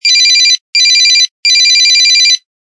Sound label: Piezo Ringer; Danger Hiptop-LX-2009; Rings